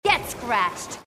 Scratch

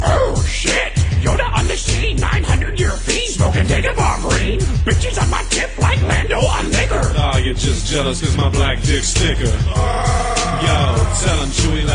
star wars rap; hip hop

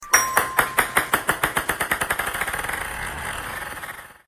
best ping tone; ringtones; sms

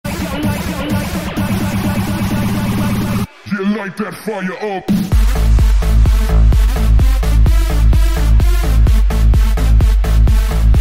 Bass

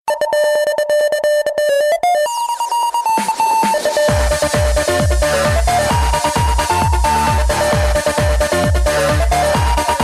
music ringtone

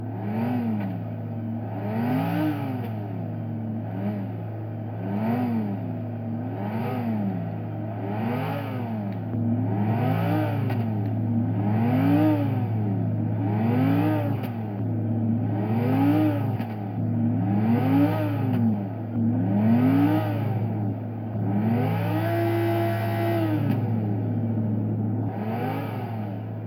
V10 Engine Revving

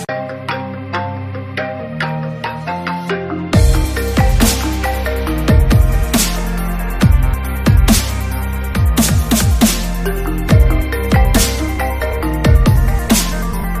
MetroGnome; iPhone

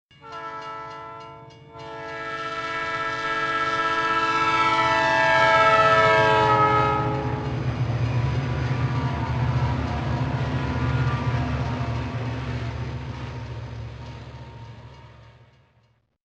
Osaka Train Arriving

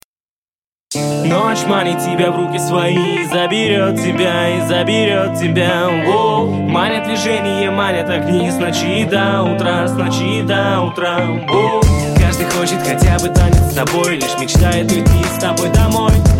Girl Party

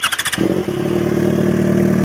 XJ6 exhaust

